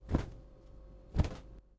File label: flying; wagic; mtg